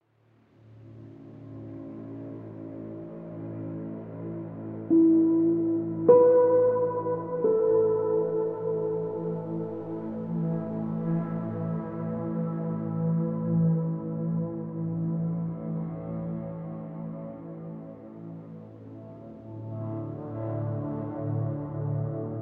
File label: Extra Drone